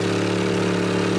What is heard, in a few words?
Buggy Car Walk; Seer; GamigoNA